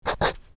pencil check mark

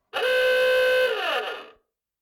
honk

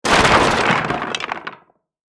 wood smash

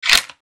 mag slide in

